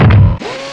biomech walk; the monster plane; kolmods steps

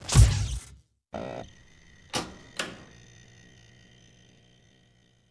power off on; Garry's Mod

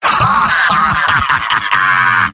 demoman laugh evil; tf2; garrysmod; mvm

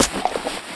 footstep; weaver

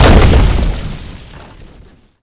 tiny toss impact; ligthouse mars; lighthousemonster

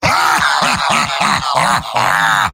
heavy laughter big; vo; tf2; mvm

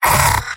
soldier pain sharp; mvm

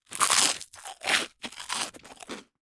eat, hungry worm; Garry's Mod; hungry worm family

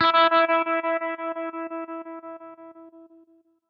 class menu, music; Garry's Mod; tf2

